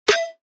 snare

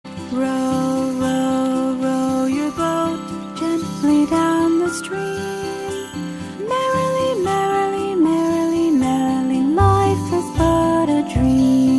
Row Row Row Your Boat Bedtime Lullaby

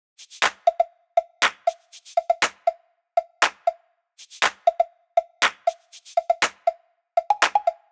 percussion loop disco cow 120

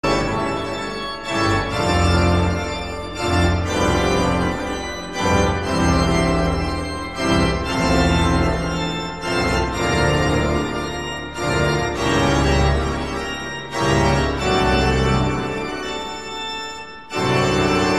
Toccata and Fugue in D Minor

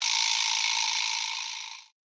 vibra slap

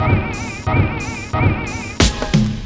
drum fill loop 90; jumpr

